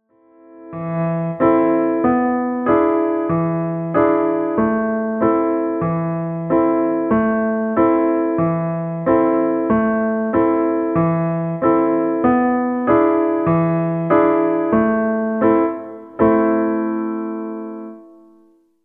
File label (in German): ludwig langsam playalong